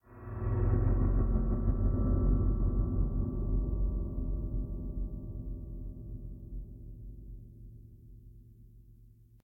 ambient dark; STALKER

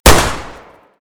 pm fire; STALKER

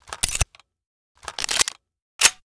pp2000 reload; STALKER

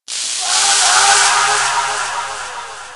ghost die; STALKER; customnpcs

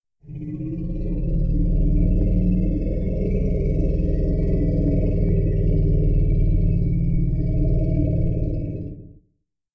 ambient mutant; STALKER

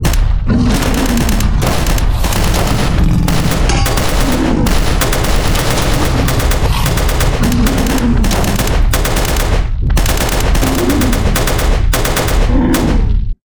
fightscene; STALKER

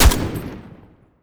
m24 fire; STALKER

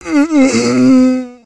death; STALKER; customnpcs; killer

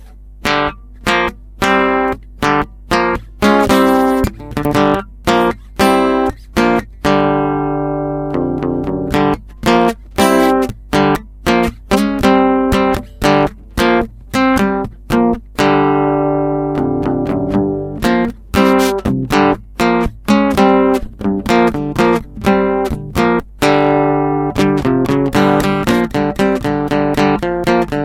guitar; STALKER

